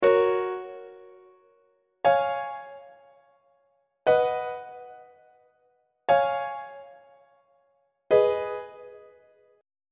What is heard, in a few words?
g flat major deceptive cadence; on treble clef